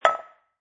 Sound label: Foley, Ceramic Bowl, Small Empty Bowl Placed Down On Concrete; Set Down; Set Downs